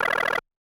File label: Videogame